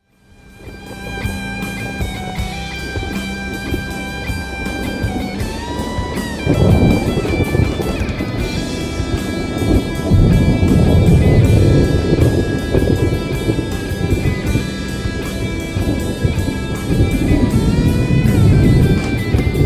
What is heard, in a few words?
RAIN